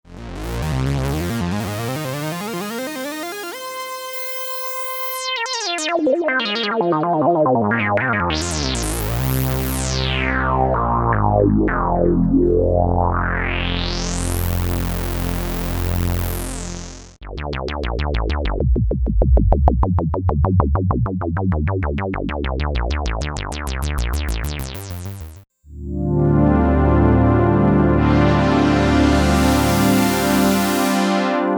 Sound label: Analog Examples